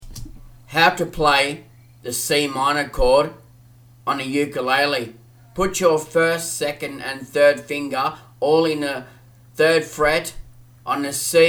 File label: C minor chord on the ukulele